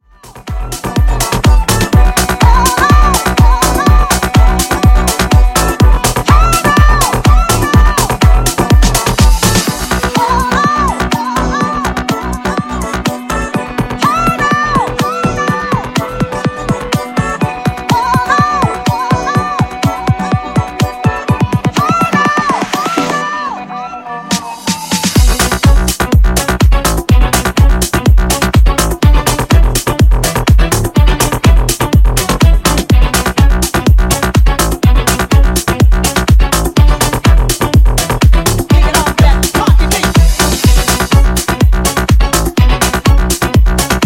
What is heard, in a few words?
Funk Bootleg 124 Bpm